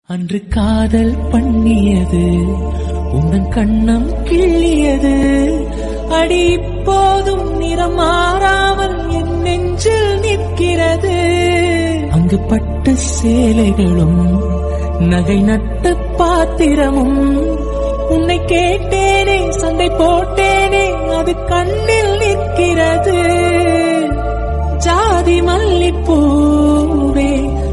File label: tamil love ringtone